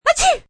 Sneeze Short Lite, Sneeze, female, short, classic, cold, sick, (human voice); Sneezing; VoiceBox